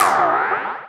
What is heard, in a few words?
Snap FX; EMU Orbit-3